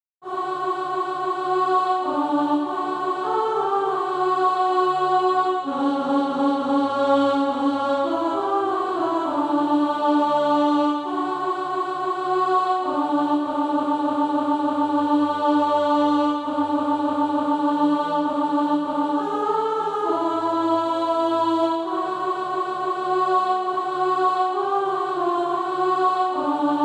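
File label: alto, Practise Music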